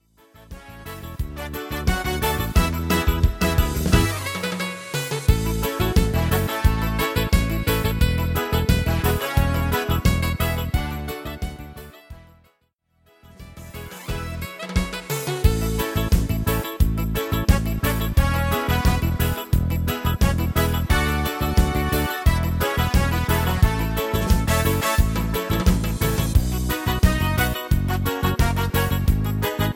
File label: Happy Reggae